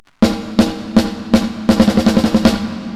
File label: Overdrive 440Hz High Pass